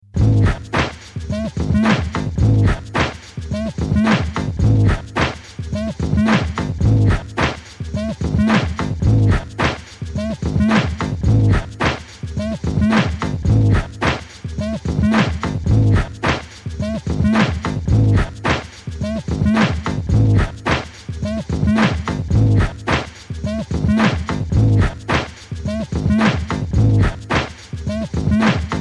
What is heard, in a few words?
beat sketch